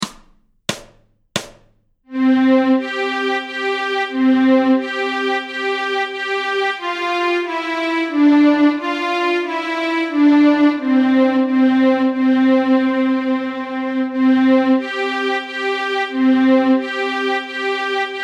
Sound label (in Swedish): Stråk C, Nyckelharpa; Adams vals; A-strängen Steg 1 Två toner i dur